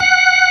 full fast, ToneWheel Organ; E-MU Formula 4000 Series Vol. 3; Analog Odyssey

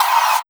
Sweep Snare; Sonic Foundry (Sony Creative Software); Esoterik Beatz; Sweep Kit

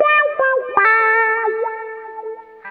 GUITAR

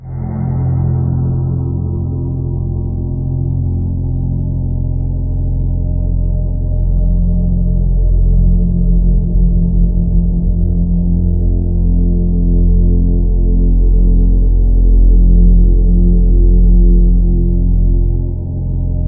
HYPNOSE, Ominous Drones, LOWTONES; USB Soundscan vol.48; AKAI

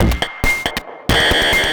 percussion loops, 138, Techno; PS-45 WAV Techno ID

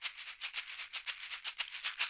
Caxixi Beats; Roland LCDP14 Africa VOL-2

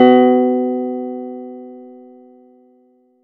Chord; ModelCycles

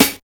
PITCH SNARE; Drums In Your FACE!

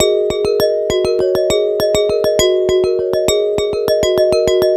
Fast Glass; Ambient; Melodic; Ambient-Melodics

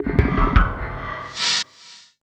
RISK LP, Industrial Loops

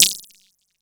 SQUISHY HAT, synth perc; Ambient; Zero-G; Ambient 1

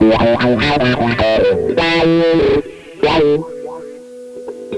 Blues-Rock Lick, Psychedelic Guitar; Hip-Hop Street Beats Construction Kit

